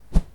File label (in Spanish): Swing De Hacha